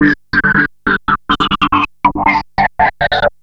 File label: Treat Guit, Industrial; Nasty